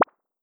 cute pop

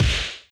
punch medium heavy

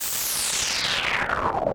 charge noise sweep

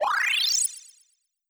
heal long high

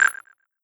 button beep bya

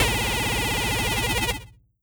laser cannon

